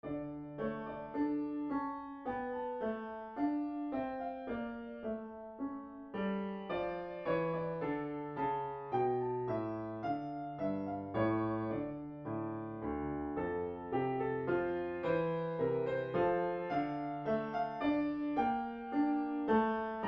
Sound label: Air; Purcell